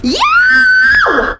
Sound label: scream